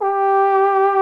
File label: VIBRATO TROM